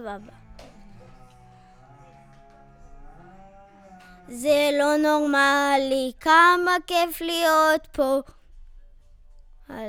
VOC

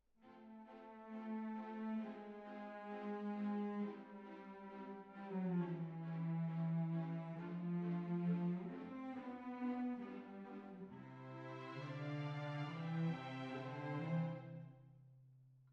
Cbasses

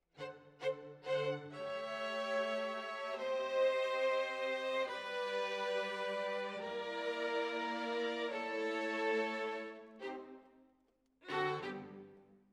Violins, jazz for piano

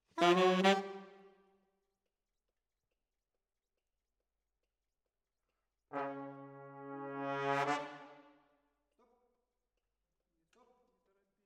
Sax

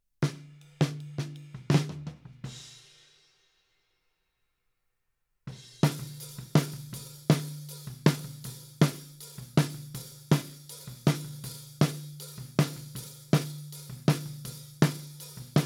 Snare Top, 160 BPM

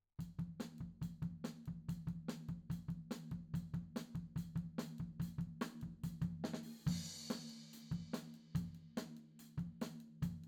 Floor

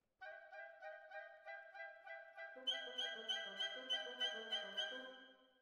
Violins Over

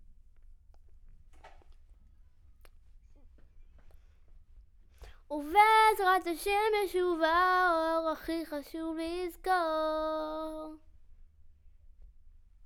Children Choir; Studio Bekol Children Choir

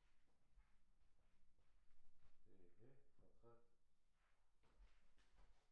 PERC OH

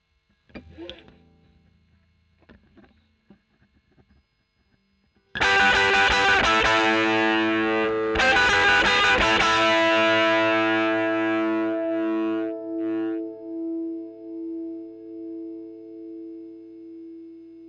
E. GTR Royer OD

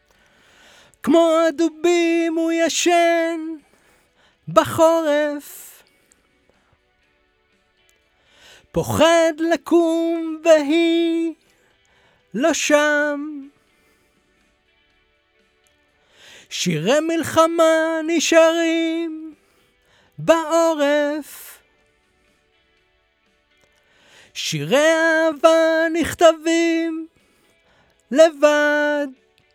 VOC

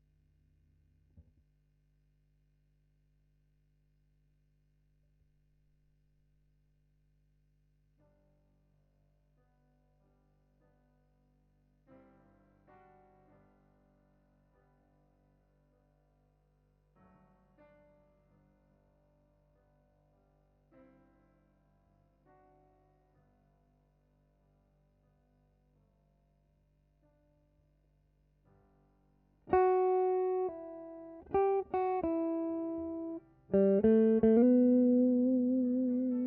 GTR B

